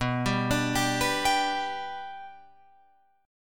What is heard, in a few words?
B Minor Sharp 5th, Guitar, Open F; Bm#5 arpeggiated; JGuitar